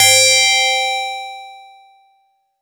tink elfin; CMP - Analogon

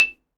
XYLOPHONE, Percussion; ILIO

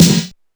drum, drumcomputer, EMU SP 12

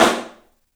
STEELS, 3D Drums, ROOM SINGLE

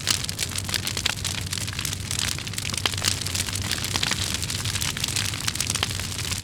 atmo; Techno Trance Essentials; FX & ATMO

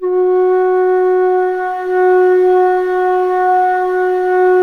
ALTO F#3, ALTO FLUTE; Historical Instruments; AKAI; USB Soundscan vol.23

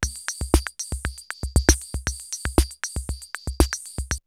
CR78 BeatBox, drumloops, DrumMachines

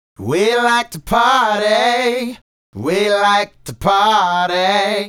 Male Group, Vocal; Sonic Foundry (Sony Creative Software); Vocal Toolbox

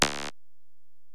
EPerc, Alesis DM-PRO; 300 Drum Machines

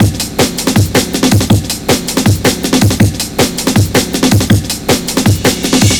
160, Drum Loops; Total Drum&Bass

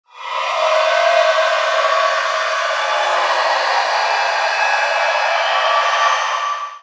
INTERSTELLAR WARP ON OVERDR, DEEP ATMOSPHERICS; Sonic Foundry (Sony Creative Software); Vir Unis Infusion

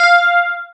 GUI, E-Guitar

